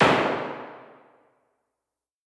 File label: EMT 140 dark, Plate; IR